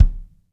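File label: bass drum, Drum 1 Dry; East Collexion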